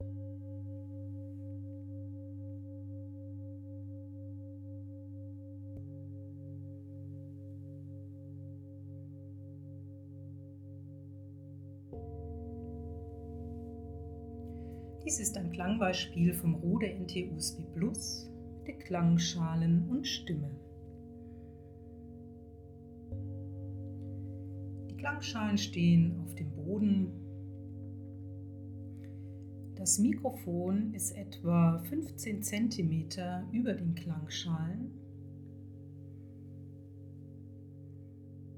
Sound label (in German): klangschalen und stimme mit rauschverminderung rode nt usb plus